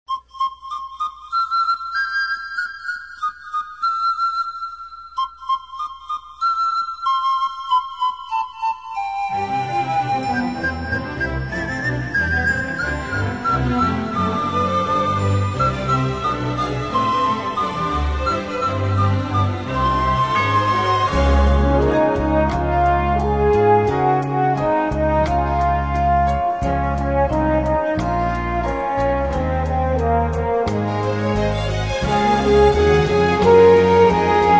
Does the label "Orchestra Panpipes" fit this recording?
Yes